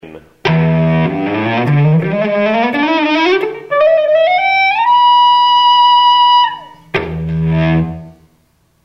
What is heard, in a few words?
Play Across Strings